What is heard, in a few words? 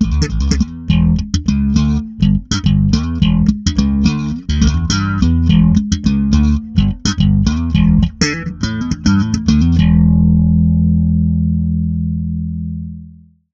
Rich Bass Env